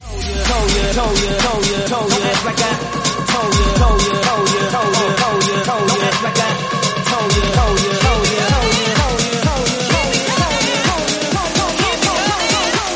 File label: 127bpm Bbm